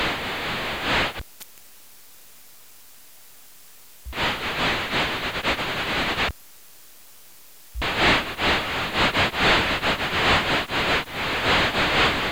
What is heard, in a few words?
148,600 MHz